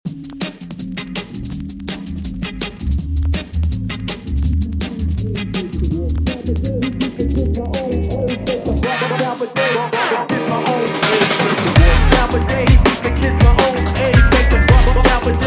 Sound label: Funk